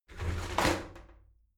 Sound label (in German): shower slide doors open regular, tueren